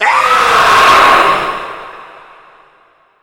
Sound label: hunter attack mix